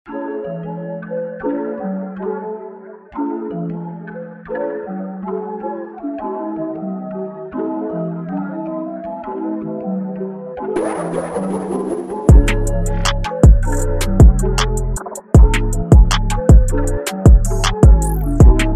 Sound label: drum kit; treesound; mike hector; mixed berries